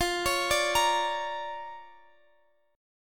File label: D Minor Major 7th Sharp 5th, F, guitar